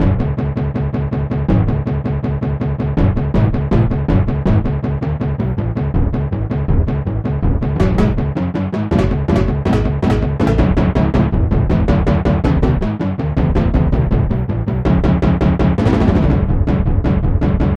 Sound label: Shield Drum; the bards tale remastered trilogy; Minstrel